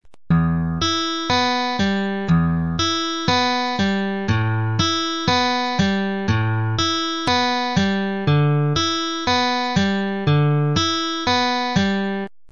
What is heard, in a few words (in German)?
Tonleiter